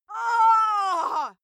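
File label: female death, screams; schreie; menschen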